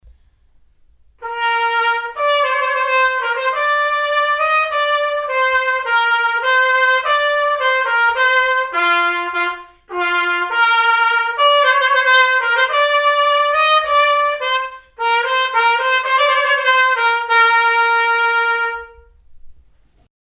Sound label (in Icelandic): trompet